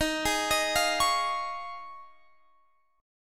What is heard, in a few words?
D sharp 7th Suspended 2nd Suspended 4th, Guitar, Half Step Down